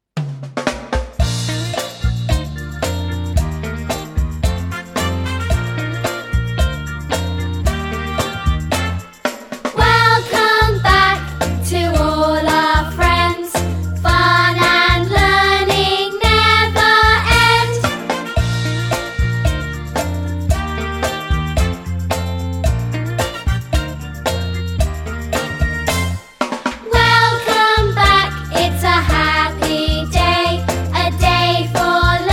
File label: karaoke